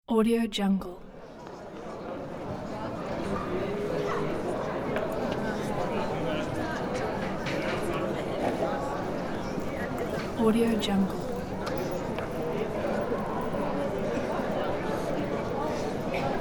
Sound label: small town square ambience